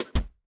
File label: reel Bounce; twin spin mw